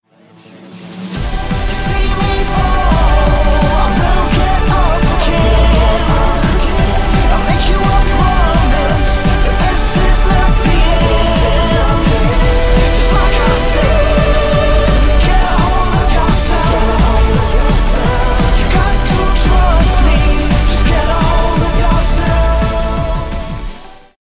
Binary overdrive

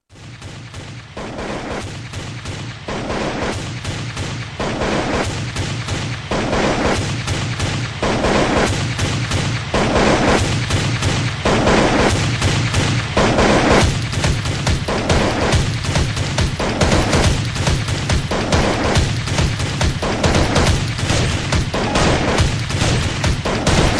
shot; Counter Strike

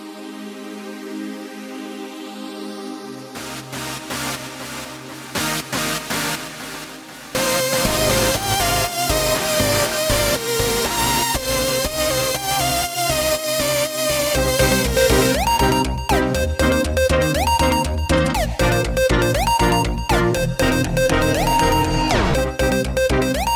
Fast Upbeat

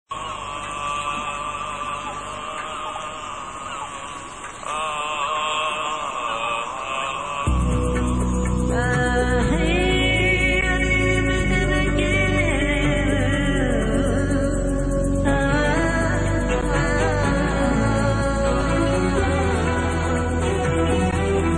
Jazz

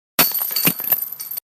Disc golf chains

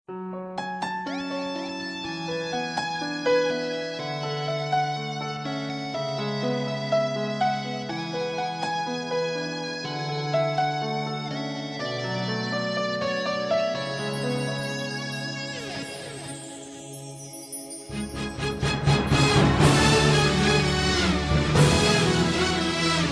bosnian sad

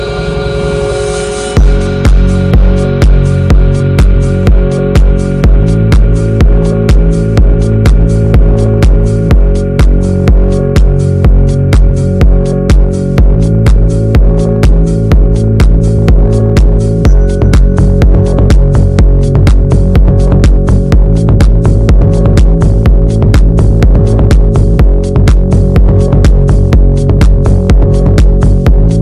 Club beats House

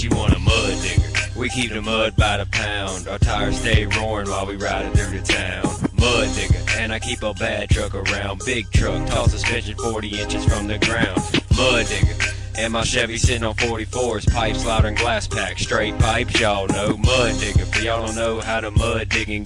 RNB

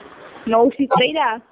banana hip hop

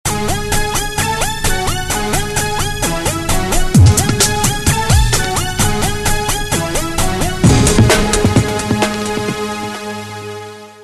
funky tone; alternative

